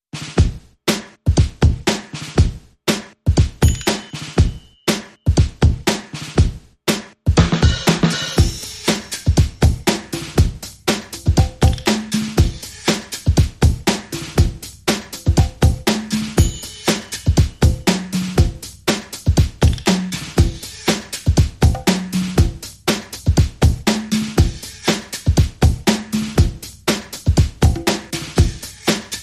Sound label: Rhythm Only